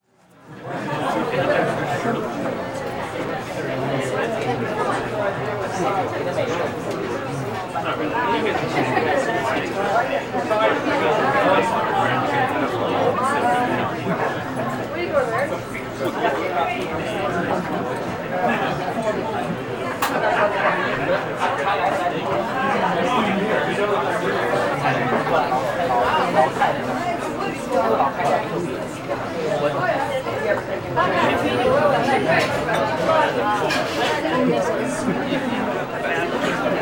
Restaurant Light Crowd Small Diner; crowd walla; Sound Ideas; Walla Series

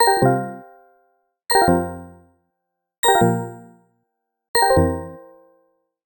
Arcade Click Neutral; boom library; The Interface

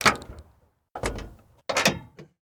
Jeep Wrangler Hood Open; Trucks

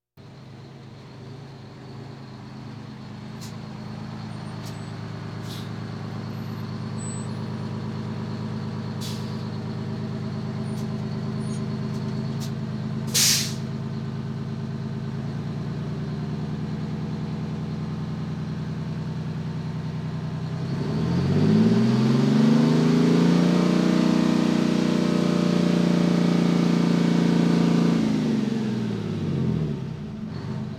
Police, Fire engines

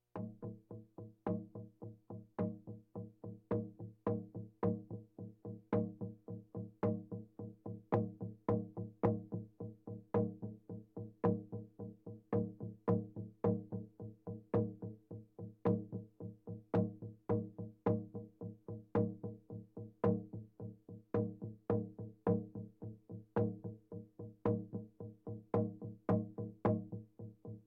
Percussion Bongos Tympani rolls Gongs Drums

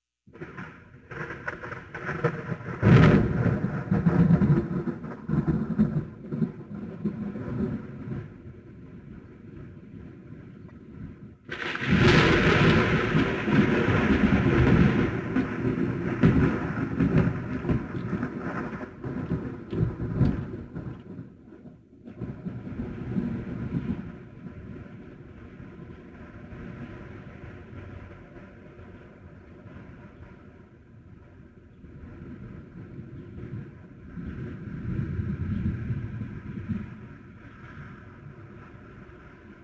Thunder And Rain; Halloween Havoc - Sound Effects; Ktel

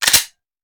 Hand Gun Foley; Sound Ideas; Guns

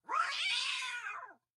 hit; wilkins; Blind Drive

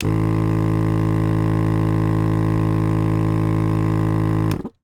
Suction Unit On Run; Medical; Blastwave

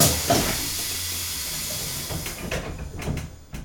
bus door; best service Blue Box

